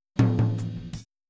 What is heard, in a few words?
Boogaloo Fill Tom Rack, 82 BPM, Drums; Sonic Foundry (Sony Creative Software) - Drums from the Big Room The Mixes